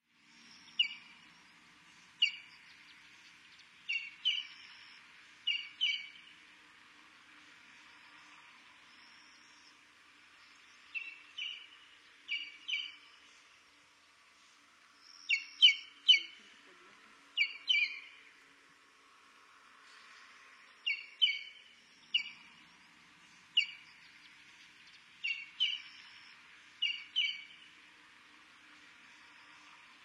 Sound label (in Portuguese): Canto do sisao de crista parda